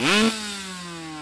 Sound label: rev Complete, Engine